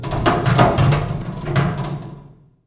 metal creak

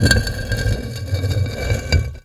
rock slide

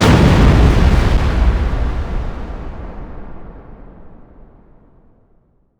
boom

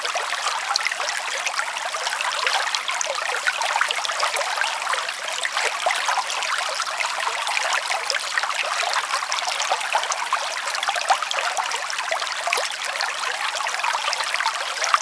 ambience water

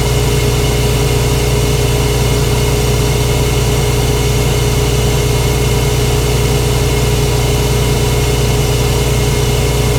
ambience

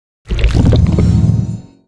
levelup

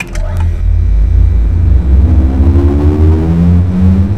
ambience prime laser particle beam

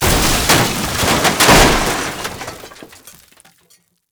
long break

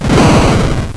fire, weapons; solbrain

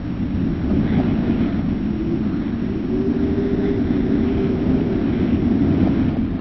wind

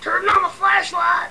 flashlight

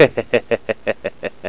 hehe

